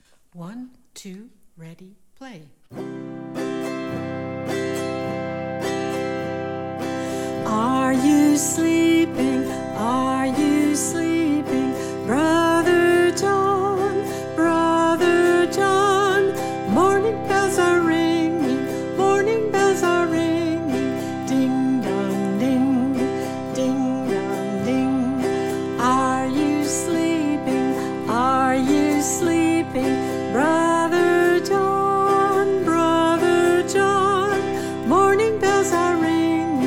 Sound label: Are You Sleeping Boom Chukka